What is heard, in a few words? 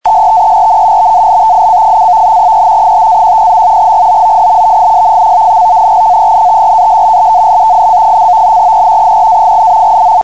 Navtex 518kHz